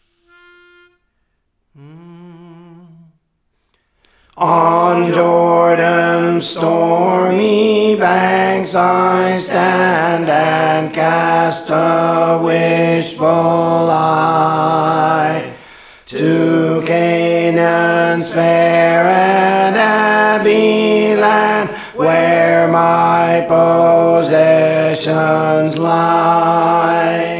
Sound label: Alto, Songs; On Jordans Stormy Banks (McIntosh); Praise For The Lord Training